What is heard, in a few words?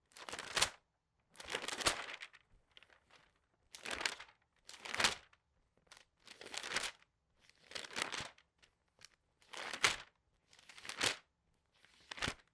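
page turning sound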